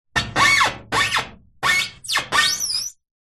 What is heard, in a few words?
vehicle car tyre rub squeak metal